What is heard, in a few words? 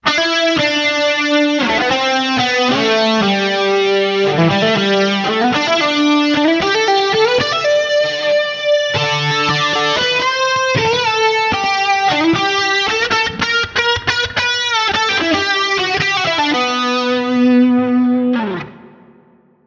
Guitar Solo Wet